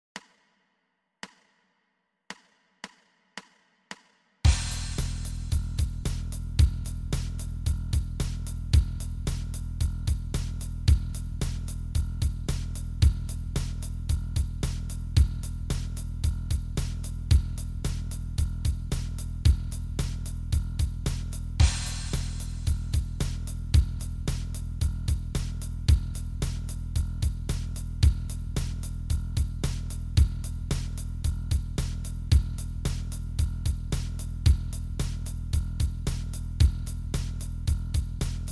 bass startup open e 112

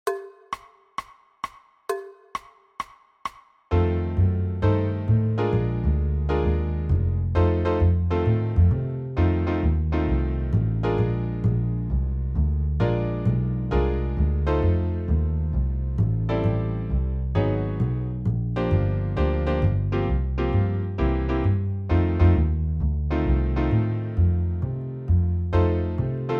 drums blues swing 132bpm

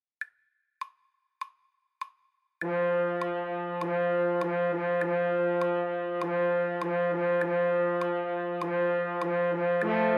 french horn fanfare

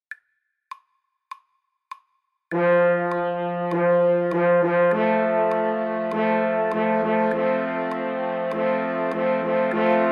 french horn fanfare

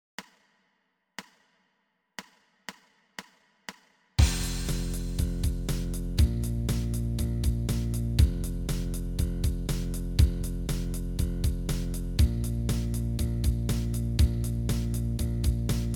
bass startup blues 120; f blues

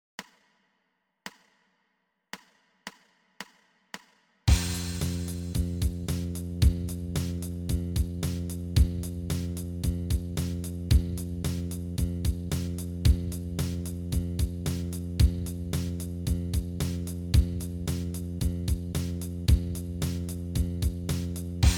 bass startup f 112b

